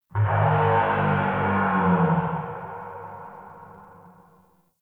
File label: Swamp Low Moan; Ambience; Midrath Island